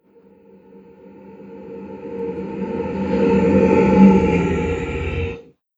Haunting Monster Sound; Ghost Sound Effects; Andrew Fleming

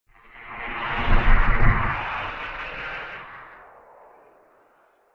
Space Science Fiction Flyby Transition; Crafted Signals

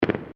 Fireworks Multiple Crackles; Backgrounds; Crafted Signals

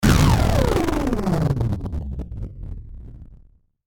Descend Electrical Static Power Down; Descending Sound Effects; Blastwave

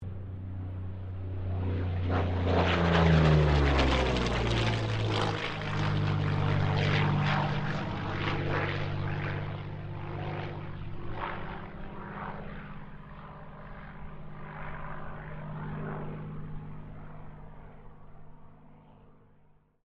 AIRCRAFT P-40 KITTYHAWK PASS STEREO

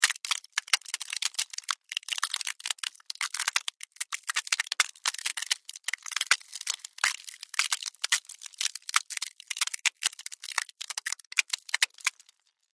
Weather Ice Crack Long; Snow

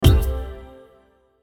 Hit Drum Flange Shimmer; Blastwave

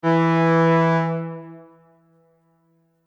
Ship Horn Bright Short, Boat Sound Effects; vehicle

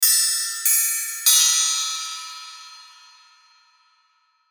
Musical Chimes, Musical Chimes Melody; Windchime Sound Effects

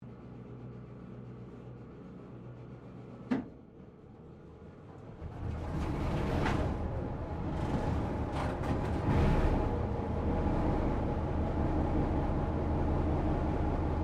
Train Diesel X Class 1951 Startup; Tasmanian; TGR; Bellarine Tourist railway vehicles; Trains

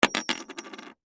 Coin Drop Single; Pirate Gold; Money; Blastwave; Foley

